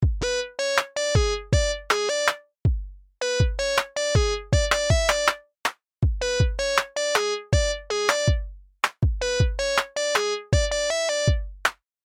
Video Game Loading, Technology, Video, Game, Loading, Screen, Loop; Video Games; BlastWave